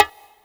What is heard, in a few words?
Perc, retro house samples, Drum Hits